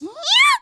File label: voice smash; Pochi